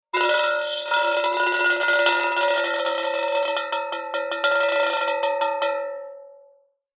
nature water drips short metal resonate ring hollow